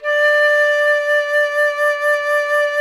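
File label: FLUTE FLUTE D 0; Roland LA; ORCHESTRA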